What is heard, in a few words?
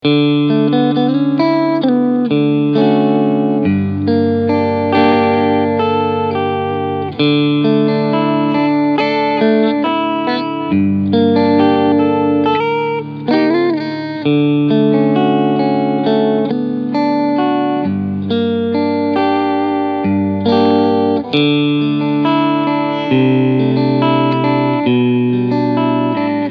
Cleansound Position